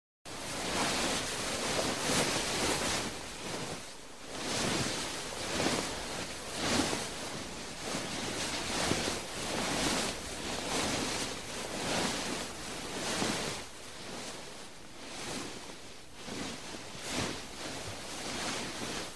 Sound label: Cloth Steady Light Movement; Hollywood Edge Foley Sound Library